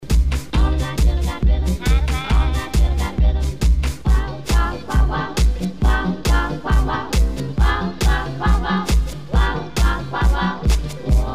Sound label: Rhythm Rhythm